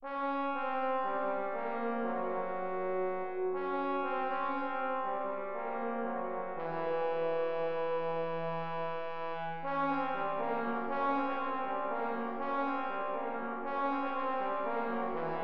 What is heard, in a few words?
Fanfare for 2 Tbns; Brass Fanfares